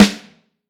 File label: Vintage Snare, Snare Drum; Q Up Arts; Johnny.C's Vintage Snare Drum; 6X14